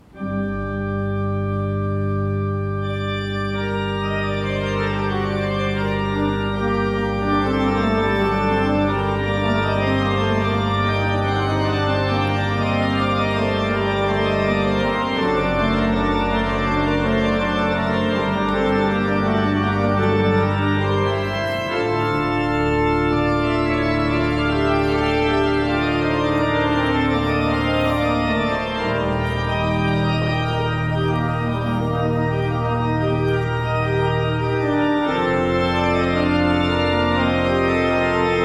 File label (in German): Auszug Orgelspiel